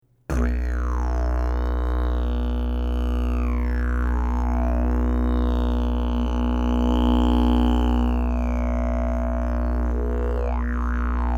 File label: didge